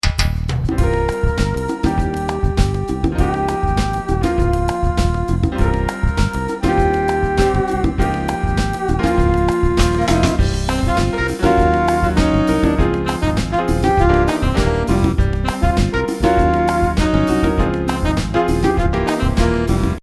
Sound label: New Latin Jazz